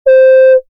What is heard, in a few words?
Clarinette